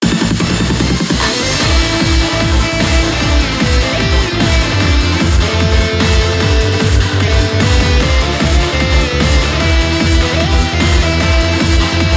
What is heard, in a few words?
cross heart synth rock; collaboration